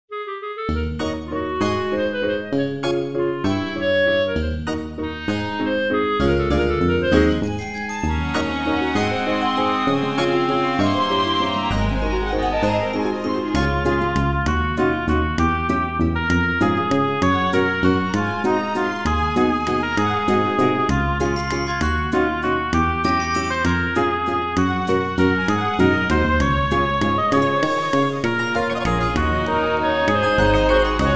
vals minus

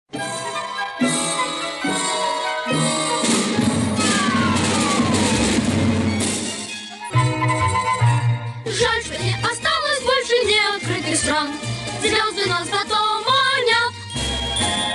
pesnja rebjat

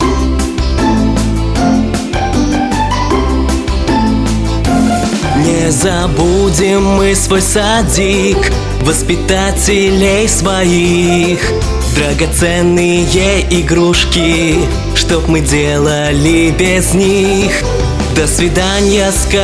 Современные детские песни, минус

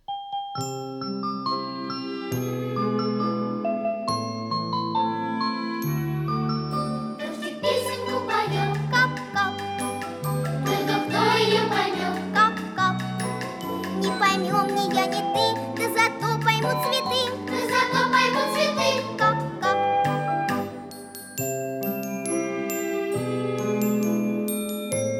detskij hor

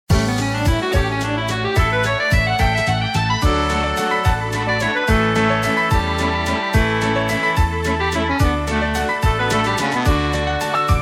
Вокальный ансамбль, минус

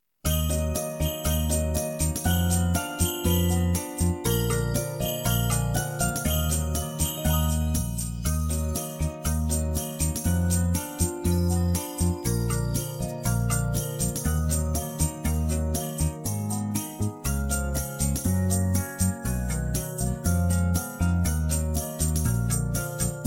novogodnie detskie pesni instrumental

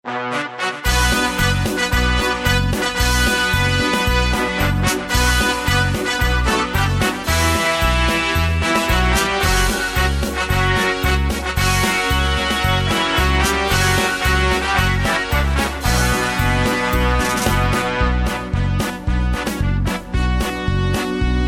detskie minus

